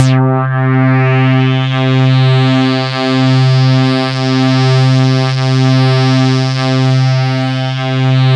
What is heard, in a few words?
C3, SynthLine; 90 s Sample CDs; NiceBeats; SynthLine VOL-21